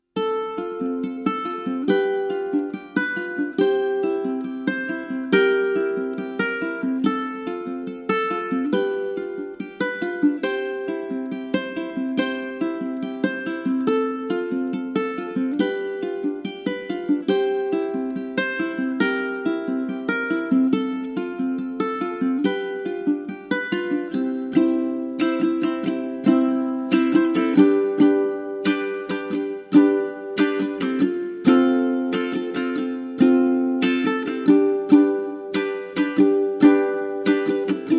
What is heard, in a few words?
Ukulele Only